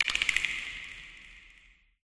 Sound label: creature antlion click; creatures